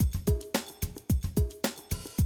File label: Classic DrumLoops, 110